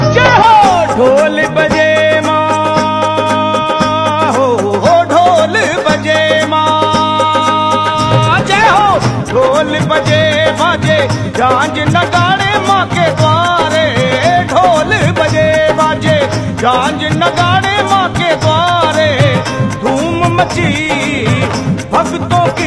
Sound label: Dhol Baje Maa; ringtones